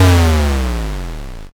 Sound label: explosion m